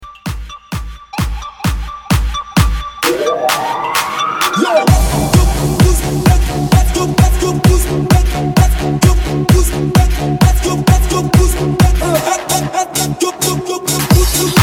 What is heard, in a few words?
130Bpm